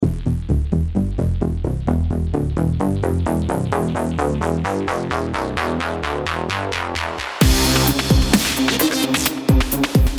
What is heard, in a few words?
ultra analog va; ultra analog va 3 demo; christian laffitte